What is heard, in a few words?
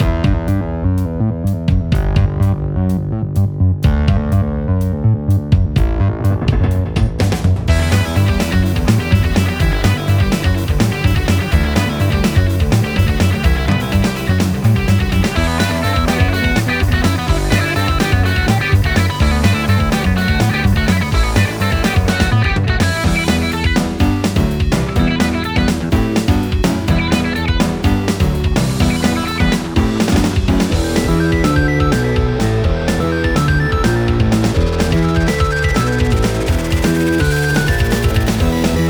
Main Theme Guitar; Galaxy Champions TV